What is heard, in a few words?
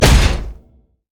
basic attack; LoL; mordekaiser